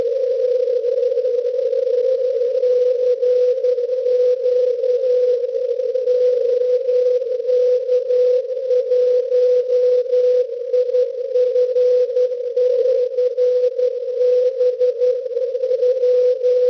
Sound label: cw